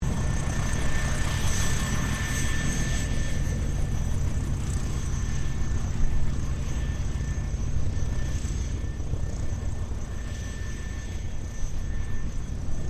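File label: helicopter Bell UH1 Iroquois idle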